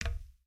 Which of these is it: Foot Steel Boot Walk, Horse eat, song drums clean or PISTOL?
Foot Steel Boot Walk